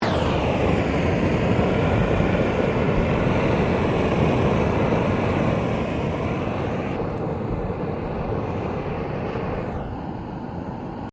TORCH GAS FLAME IGNITE, small gas blow torch; Machinery; Machines

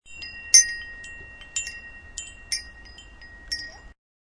Short burst of windchimes; Wind Chimes 3; Musical

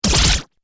Laser Stun Shots; Laser Gun Sound Effects

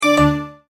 Multimedia Tone-Stop

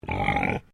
Animal Pig Landrace Grunt; Alice; Edgar's Mission Farm Victoria; Pigs; Animals

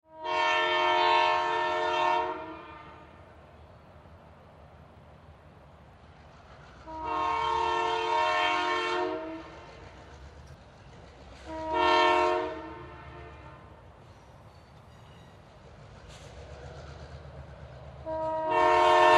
Train Pass By; Right To Left Whistle Blow; Transportation; Whistle Sound Effects; Blastwave

